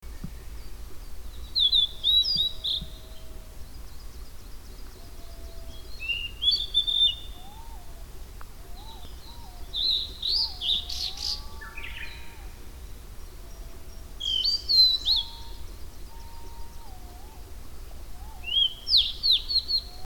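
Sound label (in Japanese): ooruri, blue-and-white flycatcher; yakkodann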